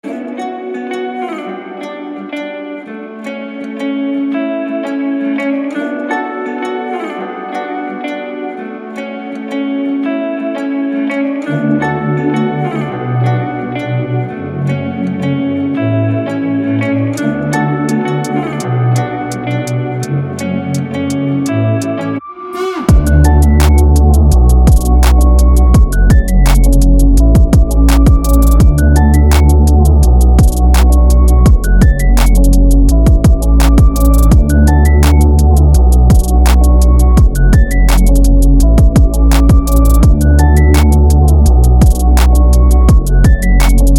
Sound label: hip hop